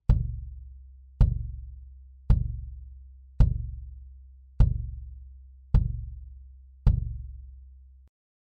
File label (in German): D12 aussen, bd mics